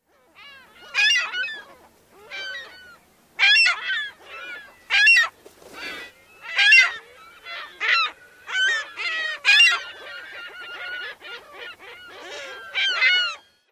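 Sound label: more calls, California Gull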